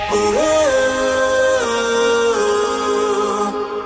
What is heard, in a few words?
awoo